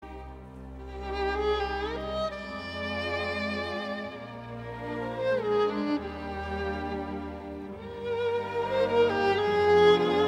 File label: Valse Triste